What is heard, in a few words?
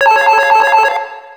Basic Electro Ring; Samsung SGH-E500